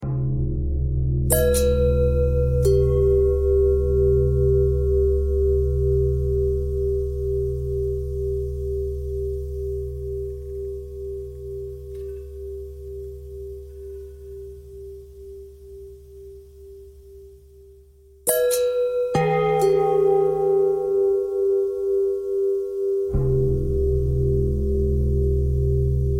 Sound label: long bell bell thread simple, bells